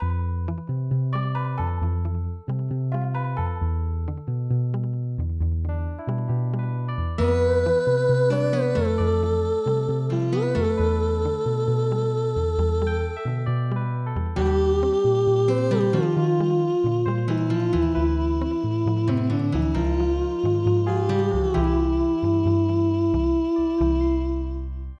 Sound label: New Wave Bossa Nova; Legend of Zelda The Majora's Mask 2000 n64